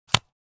Playing cards poker blackjack dealing single card

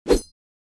Metallic whoosh metal swipe

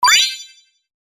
Pop up reveal

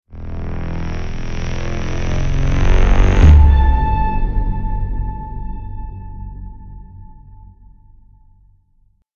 Cinematic braam hit impact; transition

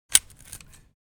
Grass shears garden single snap

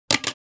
CD or DVD single drop hard surface

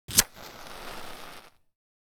Matches lighting with fire burning striking

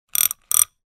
Socket wrench clicking turning

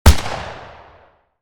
Beretta handgun single gunshot close

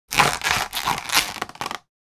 Hard plastic crunch; gfxsounds

